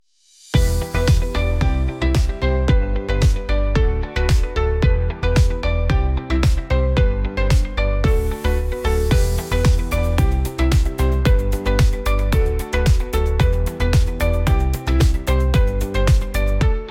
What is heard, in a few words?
Synthpop Spell